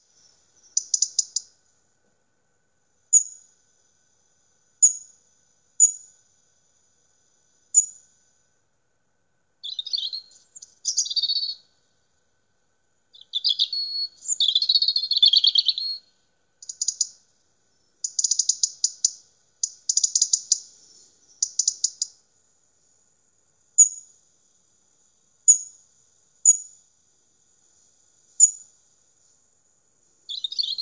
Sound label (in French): Rouge gorge